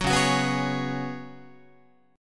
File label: C sharp 7th Suspended 4th C sharp, Guitar; C#7sus4; strummed; JGuitar